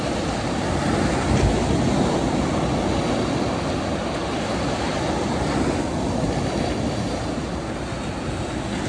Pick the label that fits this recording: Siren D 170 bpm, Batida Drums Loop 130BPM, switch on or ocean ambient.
ocean ambient